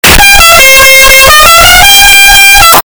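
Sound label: goofy loud car horn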